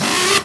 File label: Synths F#; vengeance essential club sounds-3